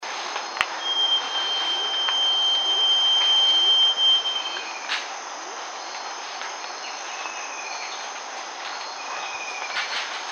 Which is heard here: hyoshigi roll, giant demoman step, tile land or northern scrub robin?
northern scrub robin